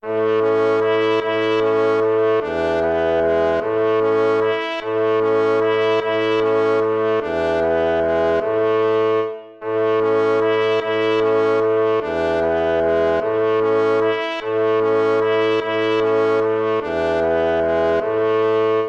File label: waltz; Carulli Ferdinando